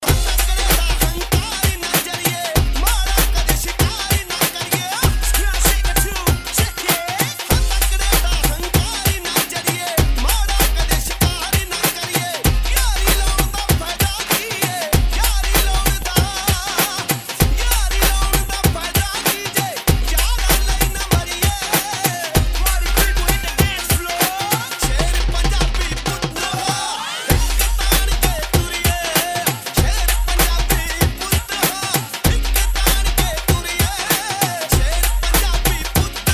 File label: Punjabi, Street Mixes; UK Punjabi